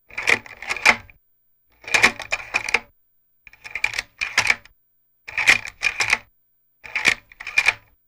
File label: Slide Projector; projectors; movie & projectors